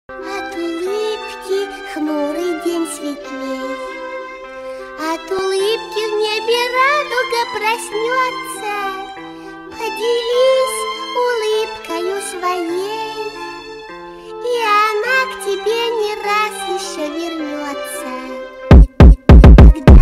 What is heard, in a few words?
trap remix